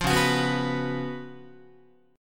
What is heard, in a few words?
Db 11th, Guitar; strummed; JGuitar